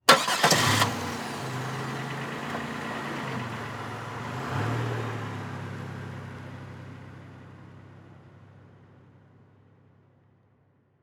Transportation Car Monte Carlo Start Drive Off Slowly